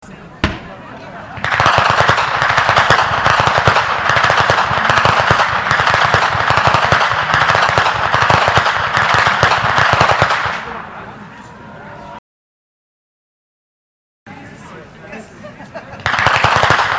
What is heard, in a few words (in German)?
Schnalzen; Ulrichshogl; Ainringer Schnalzen